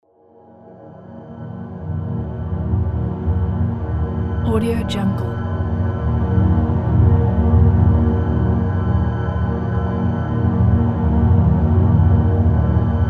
epic dark undertone

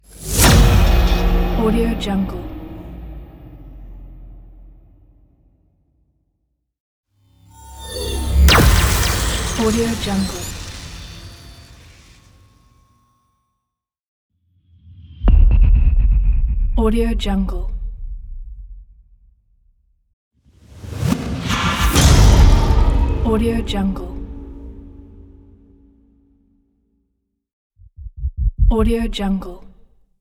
trailer punchy sfx

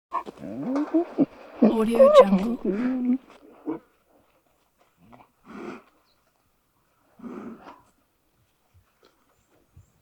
hybrid creature vocals lion cub

